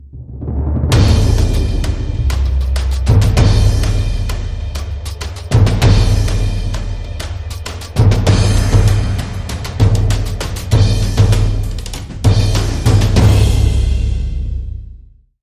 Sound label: Drums and SFX